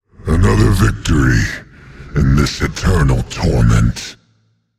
"yay"; smite; voicepack; set

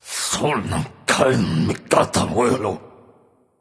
grumble; smite; odin

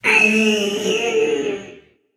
grunt lift, voicepack; smite; jorm

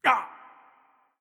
hitreact human male small; smite; npc; roman archer

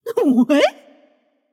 confused light; smite; voicepack; hel

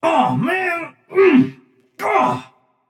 grumble; smite; xbalanque

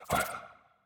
zombie vox; smite; ahpuch skin10

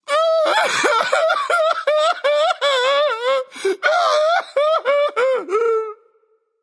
boohoo; smite; odin

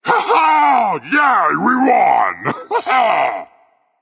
yay berserk; cuchulainn

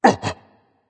surprised berserk; cuchulainn

